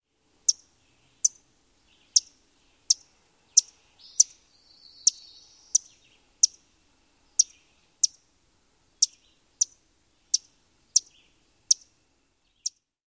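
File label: calls, Eastern Wood-Pewee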